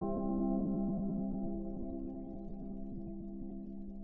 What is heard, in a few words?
harp test